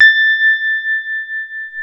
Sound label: DX ROADZ SFT A5, FM Paired Tines; E-MU Producer Series Vol. 2 – More Studio Essentials; Keyboards